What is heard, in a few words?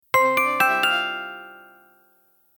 sms music